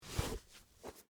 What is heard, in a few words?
Dress Shoe Off, No, Laces; Foley, Shoes; Dressing; BlastWave